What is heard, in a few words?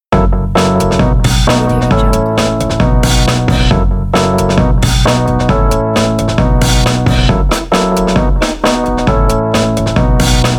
funk intro logo; nikin